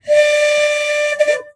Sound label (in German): Lokpfiff